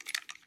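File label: gear rattle weapon small; viper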